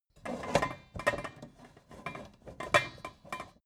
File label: pan handle; berufe